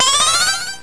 eat vit

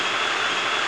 SAW UP

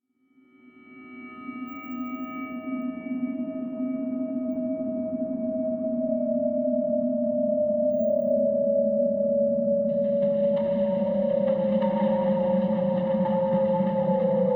transmit, ambient; factorio; Factorio 0.14.21

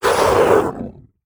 worm death; factorio; Factorio 0.14.21; creatures

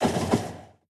train tie; factorio; Factorio 0.14.21

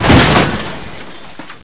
SPLAT

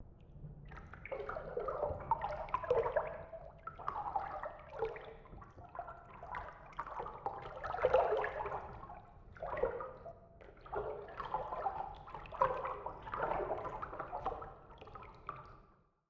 storage tank; factorio; Factorio 0.14.21

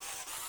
inserter fast; factorio; Factorio 0.14.21

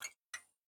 train stop; factorio; Factorio 0.14.21

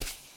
sand, walking; factorio; Factorio 0.14.21

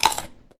eat; factorio; Factorio 0.14.21

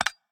deconstruct medium; factorio; Factorio 0.14.19

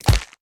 axe meat; factorio; Factorio 0.14.19

